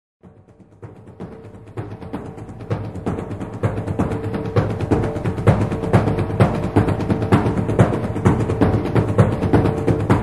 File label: The Ranjit Nagara Drum